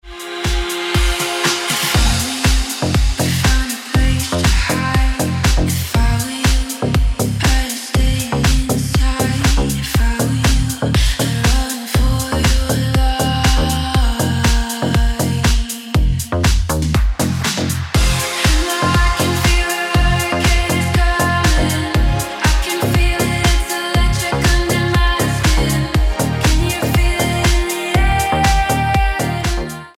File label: Ringtone